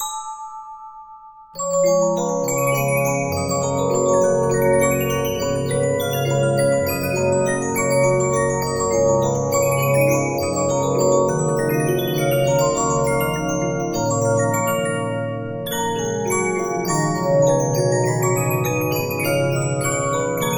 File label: Regina, 15inch disc musicbox